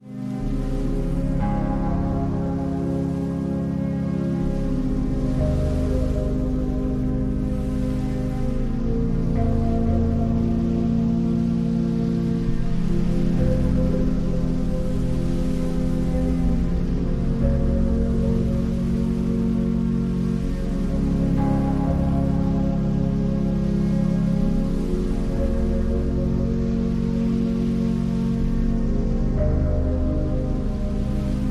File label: Meditation; Lemuria